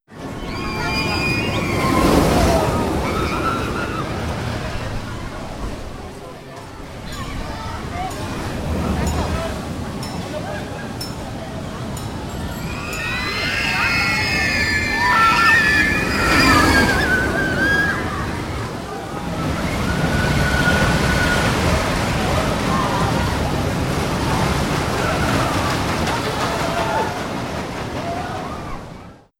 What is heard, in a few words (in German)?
Atmo Fahrt